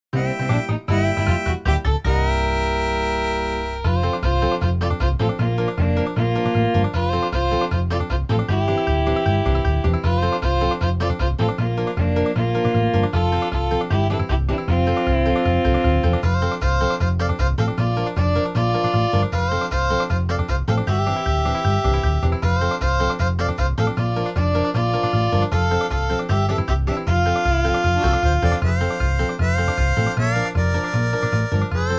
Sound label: Horse Race; Ocarina of Time Official Soundtrack